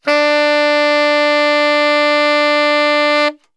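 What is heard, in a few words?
D 2X SAX STR, saxophone; Best Service; Brass Super Section; SAX STRGHT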